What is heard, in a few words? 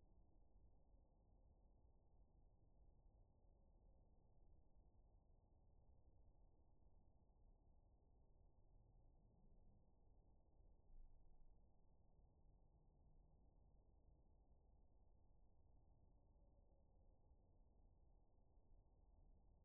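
hydrophone; ICLISTENHF; 2000Hz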